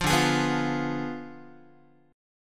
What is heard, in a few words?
D Diminished 7th D down Guitar; strummed